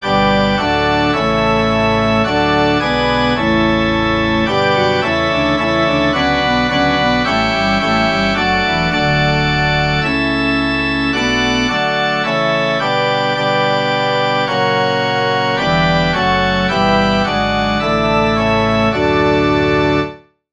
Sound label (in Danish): forspil; ddk 219 hjerte lft din gldes vinger